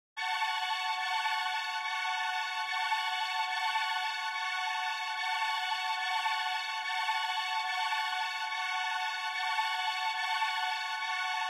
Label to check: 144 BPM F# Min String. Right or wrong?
Right